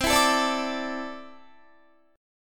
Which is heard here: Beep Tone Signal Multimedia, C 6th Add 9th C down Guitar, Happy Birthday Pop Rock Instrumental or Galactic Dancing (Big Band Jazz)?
C 6th Add 9th C down Guitar